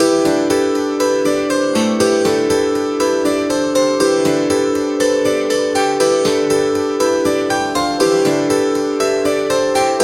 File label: valentine love ringtone